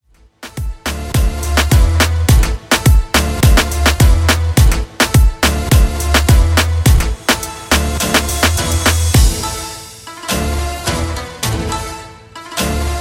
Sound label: Moombahton Remix 105bpm; remixplanet